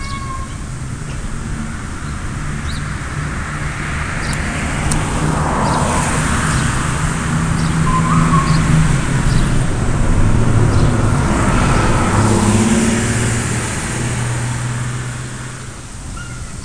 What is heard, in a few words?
City Amb Quiet; Creative Review 23